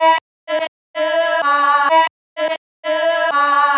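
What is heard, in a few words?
chopped vocals female E minor 127; sound effects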